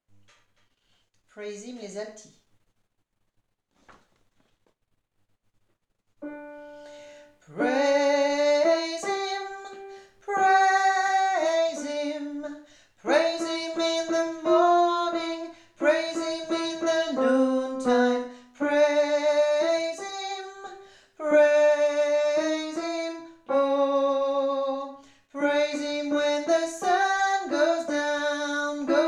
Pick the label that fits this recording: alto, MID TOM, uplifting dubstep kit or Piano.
alto